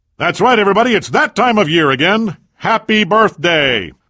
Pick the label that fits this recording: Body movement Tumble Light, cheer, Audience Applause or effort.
cheer